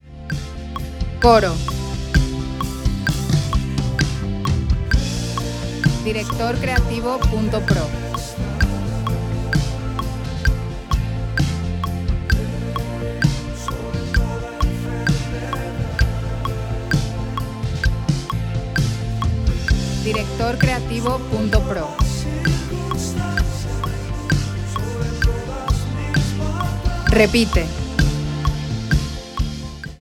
F, 65, 4/4

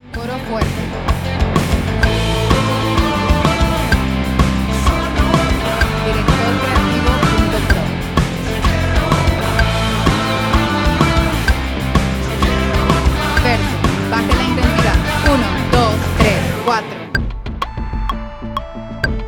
No Soy Normal, 127bpm, 4/4, 7/8

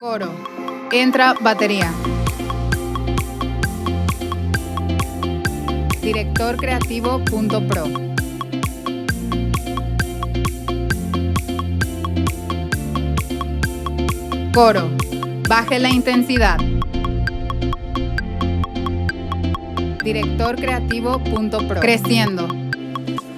Decido, Remix, F, 132, 4/4

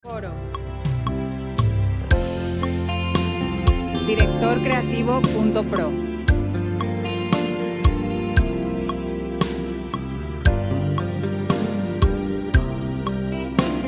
57.5BPM, 4/4, F

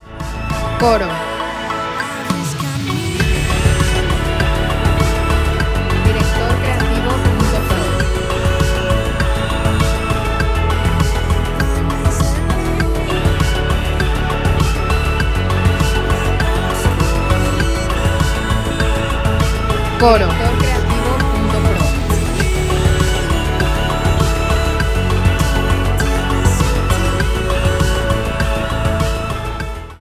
Caminos, A, 100, 4/4

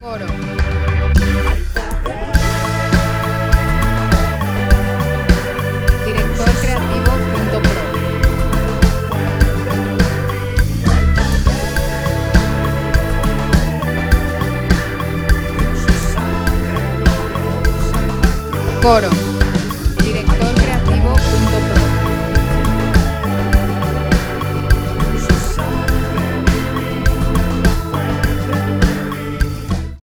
A, 102, 4/4

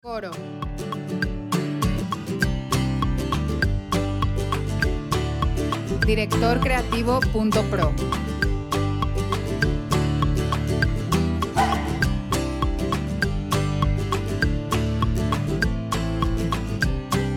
Solo Tu, G, 100, 4/4

